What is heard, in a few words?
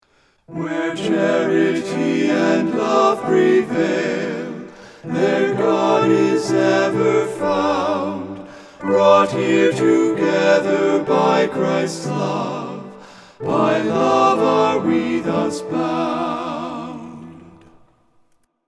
SATB; Where Charity and Love Prevail